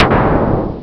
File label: Shoot; Ultima III